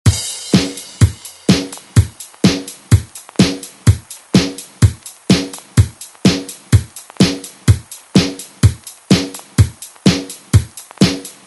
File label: 80s ReDrum; DJ Mhark